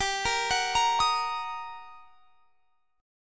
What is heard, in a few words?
G Minor Major 7th Sharp 5th; arpeggiated; GmM7#5